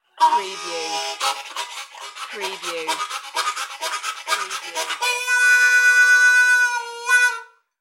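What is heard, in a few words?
Harmonica Train Riff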